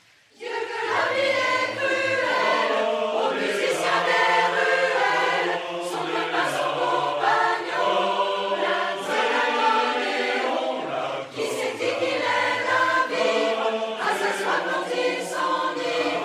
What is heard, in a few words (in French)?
extrait accordeon